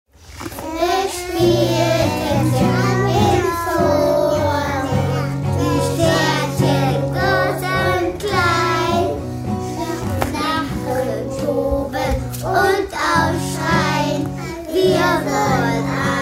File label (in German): Sternchen Lied